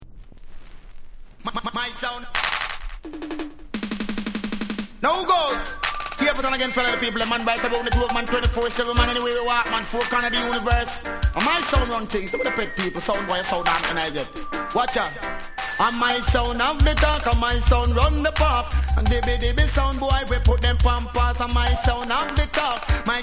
REGGAE SOUND WAR

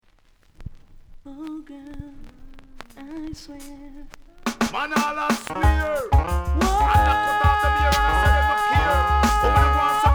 TENOR

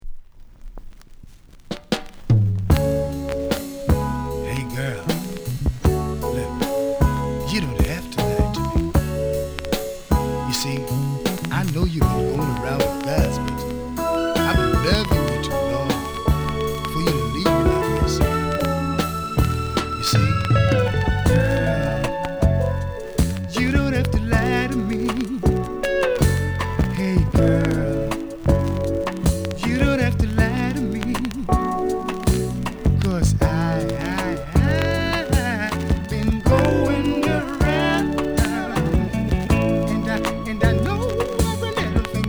SOUL REGGAE

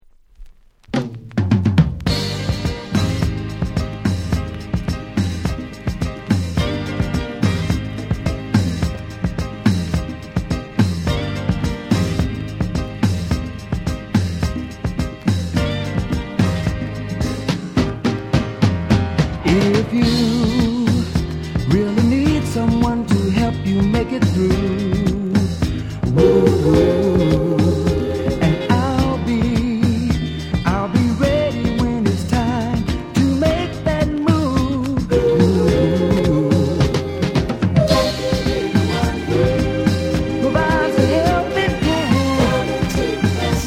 REGGAE DISCO

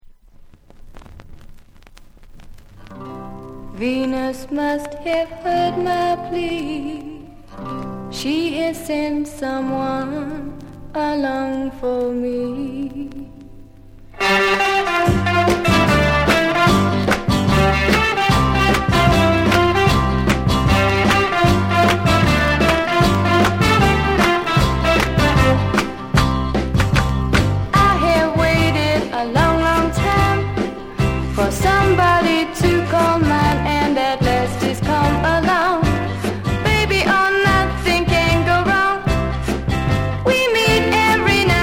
GLISSANDO LONG

